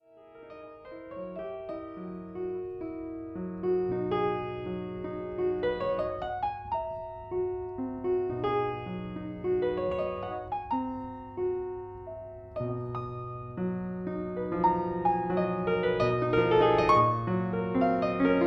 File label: welte-mignon poeme fis-dur; Alexander Skrjabin; opus 32 nr 1; stereo